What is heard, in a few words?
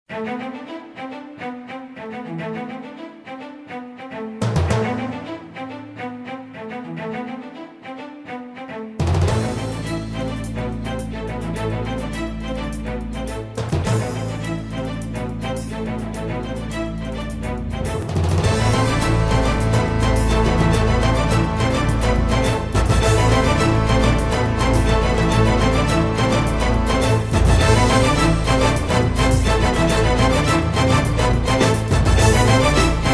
Instrumental